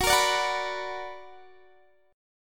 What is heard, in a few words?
B Minor Major 7th